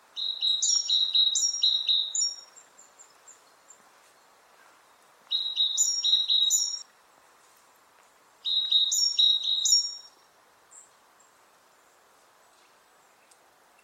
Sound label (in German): Gesang Kohlmeise Parus major